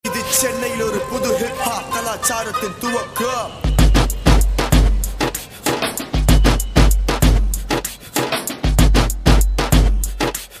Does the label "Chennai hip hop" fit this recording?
Yes